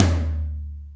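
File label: Tom Ambient Lo, Alesis D4 drum machine; 300 Drum Machines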